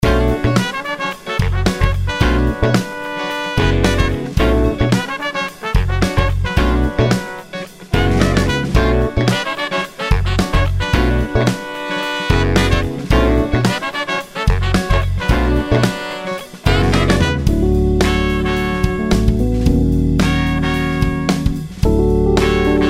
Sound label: Acid Jazz Attack